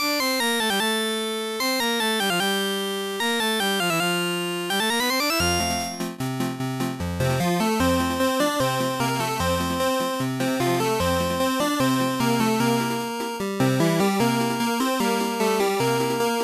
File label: Italian Folk Song; creative music system; ibm pc xt at; Kaboli Island